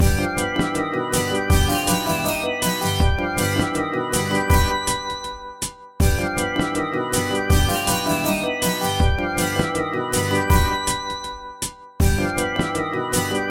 Beat

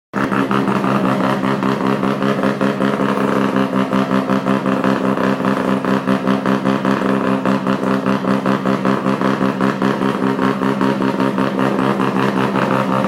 rotary rx7

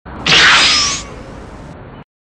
Teleport

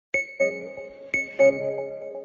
new blackberry 3d, sms

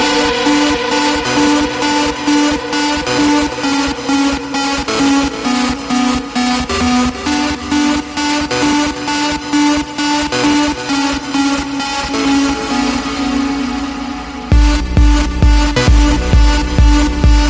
Nice trance beat

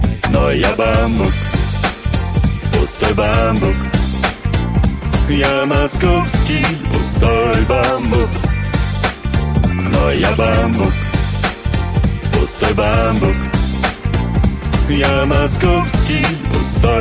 moscow bamboo; jazz

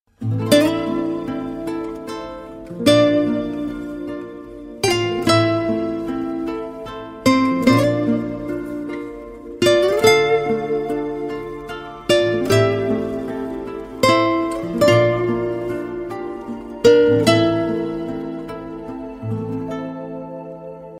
Guitar

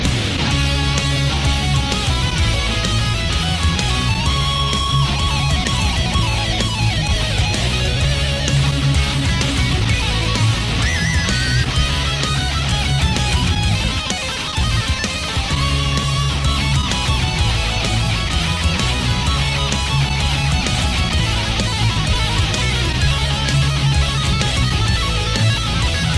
crazy guitar solo

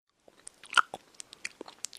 Mouth Smacking

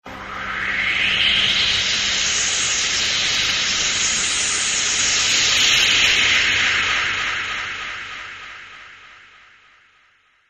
air sms

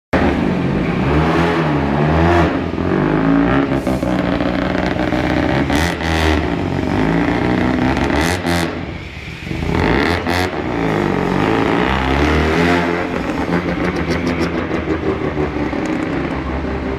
loud rx7

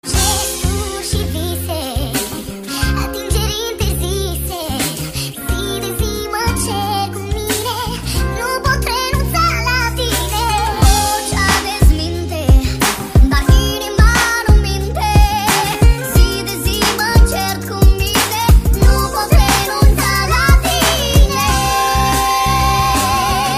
Vox Latina Remix

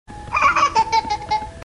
boo

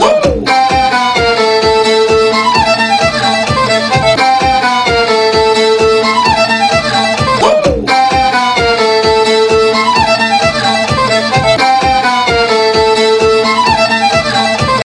Violin Beat

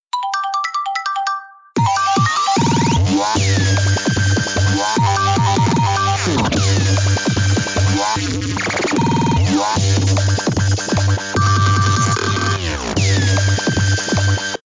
iphone remix dub

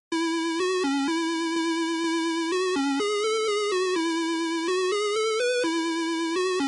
Melody, ringtones

